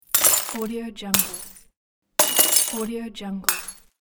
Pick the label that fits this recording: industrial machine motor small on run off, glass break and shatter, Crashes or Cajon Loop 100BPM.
glass break and shatter